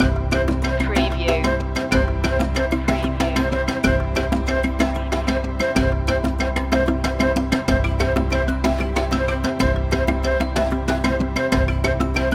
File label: african spirit loop